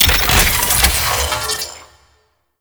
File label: Console Hack Start; Warframe; Protea Quest